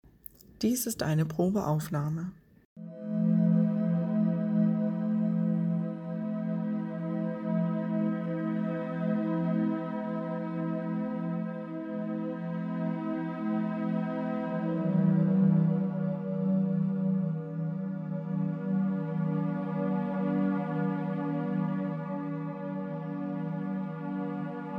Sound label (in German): 639 Solfeggio Hoerprobe Ambient Waves